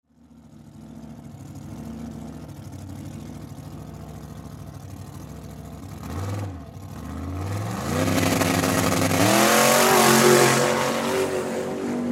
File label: Swamp Buggy Race Start; Transportation Watercraft; Boat Sound Effects; Boats (Sound Effects:Vehicles); Blastwave